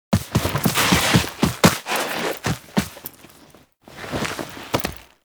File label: Foley Run; Narmer Outpost End; Drifter